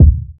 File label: tight lows bass drum one shot D# key, Royality free kick drum tuned to the D# note; D sharp key